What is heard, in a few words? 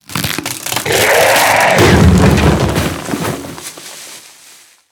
Torghast Custodian Death